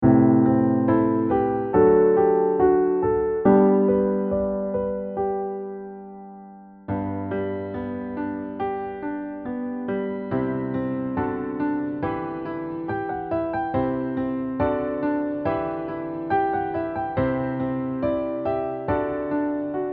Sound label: Male Instrumental